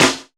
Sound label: SNARE; E-Lab; X Static Goldmine 2; akai